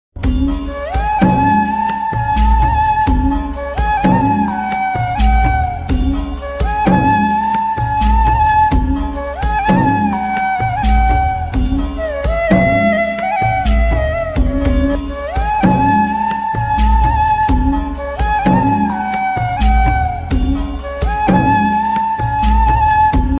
bengali best ringtone romantic ringtone new sad ringtone; I Love You movie